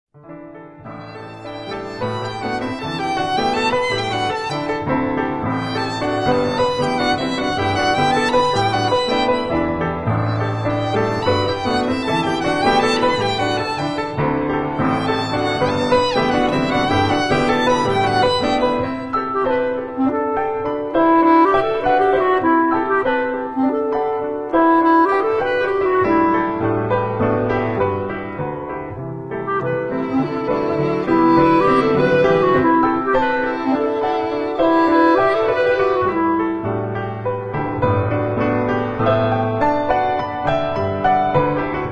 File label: Trio for Violin, Clarinet & Piano